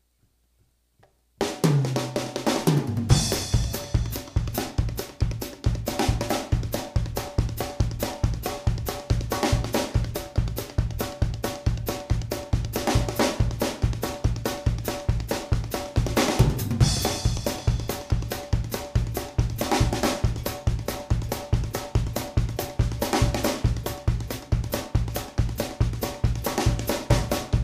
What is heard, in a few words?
Country, 140 BPM, A "train" style cut-time groove played with nylon brushes.; drum